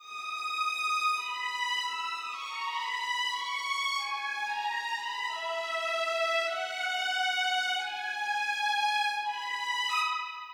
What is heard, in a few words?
Violin, バイオリン; 生楽器; スピッカート; 2つ目のバイオリンは最後の「キン！」というスピッカートの為だけに用意したからです。